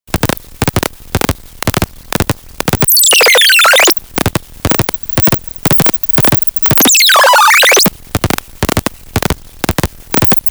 Thingamagoop LFO Rhythm

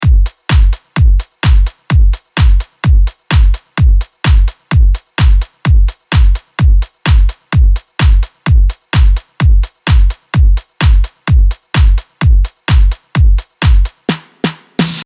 AMS Neve 8801 loop saturation

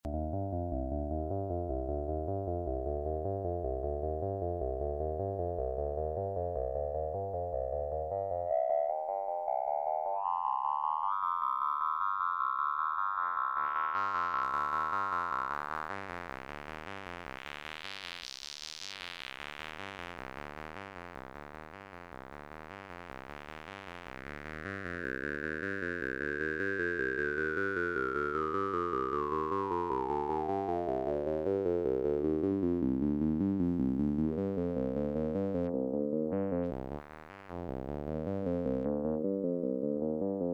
MicroFreak Granular Formant

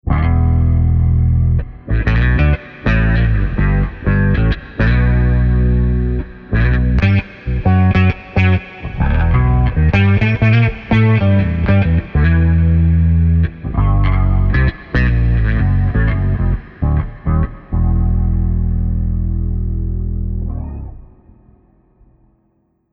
BOSS GT1000V3 NAT BASS OCT CH REV

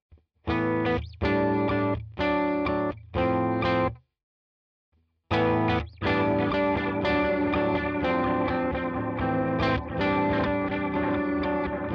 UAD DelVerb AnalogMod

